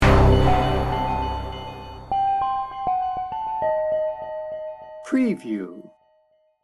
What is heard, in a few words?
evil intention sting bumper